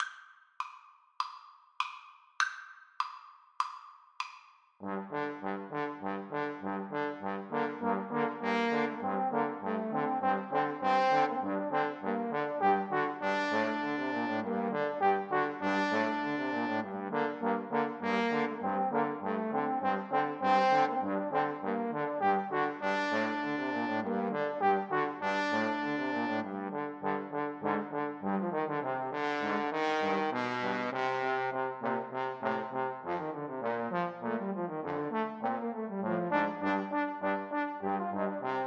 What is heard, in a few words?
trombone trio